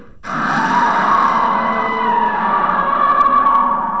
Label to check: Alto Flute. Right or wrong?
Wrong